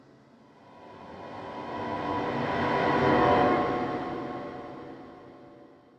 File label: echoes; thief 2014 gamerip